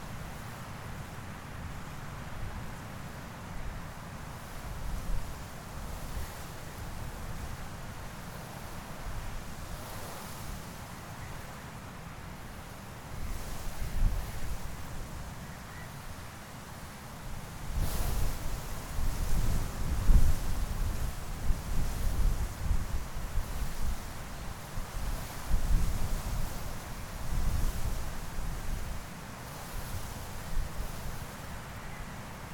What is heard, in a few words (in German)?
Wind im Gras; ATMO